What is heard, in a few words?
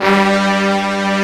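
BRASS G2 left; BRASS#1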